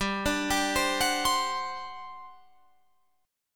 G Major 7th Suspended 4th, G, down, Guitar, Drop D